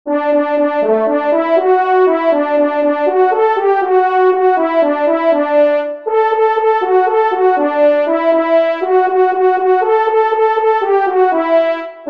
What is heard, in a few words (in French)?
Rembuche Solo, trompes; Fanfares imposables en Concours